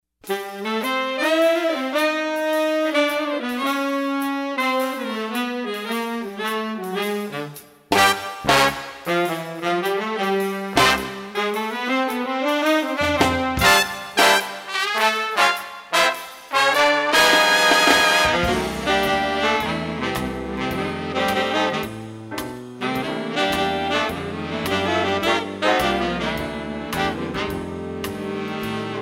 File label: sax